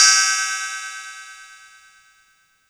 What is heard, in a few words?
Cymbal; TRX